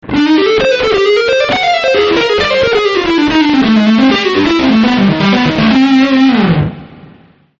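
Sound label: long sustain lead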